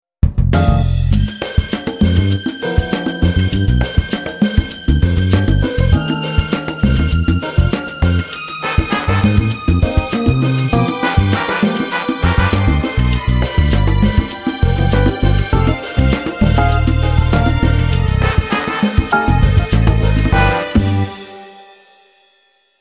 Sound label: Disco